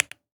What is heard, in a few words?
key press; « ★ F u w a ★ »